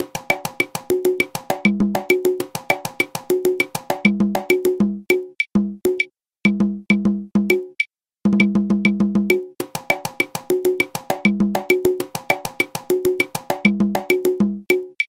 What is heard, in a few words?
timba cierre